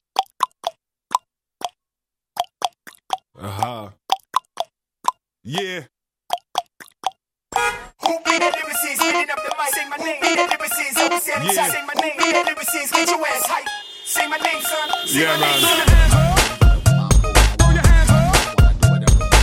3D Nokia ringtone

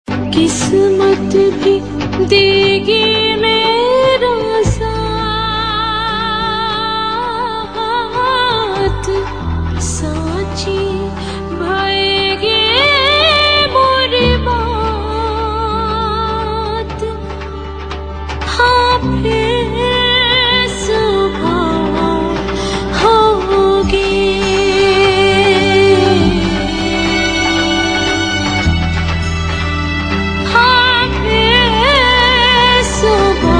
Sad Tune; Zee TV; Phir Subah Hogi; TV Serial Tone

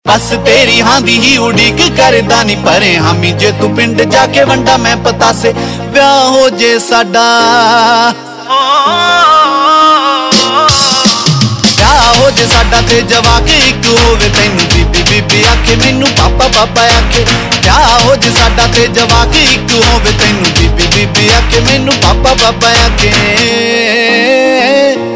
Ringtone, Punjabi

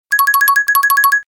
Executive Tone

